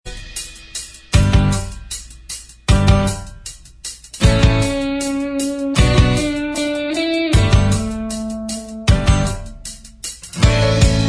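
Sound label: Instrumental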